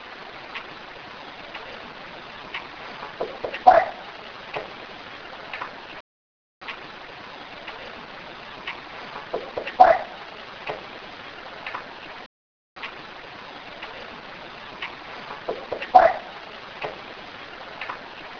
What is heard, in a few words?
Wierd Noise; Almost sounds like a drop of water splashing, but amplified; Weird Noise 2